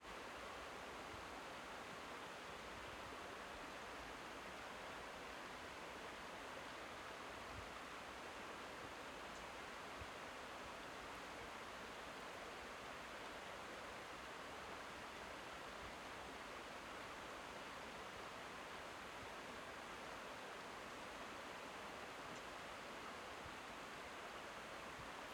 Ambience, Forest, Stream, Quiet, Spring in the Pacific Northwest; Ambiences; Raconteur Sound